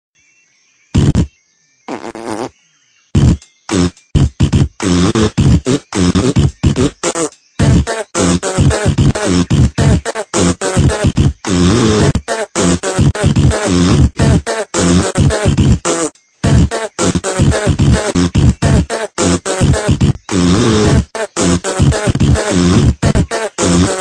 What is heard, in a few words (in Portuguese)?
cancao do peido